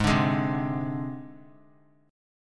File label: G sharp Major Flat 5th; G#Mb5 strummed; JGuitar